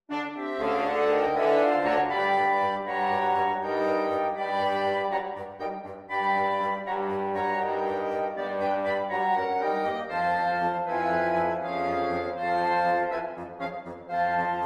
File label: Fanfare, Wind Sextet; 2 Bb clarinets, 2 horns in F and 2 bassoons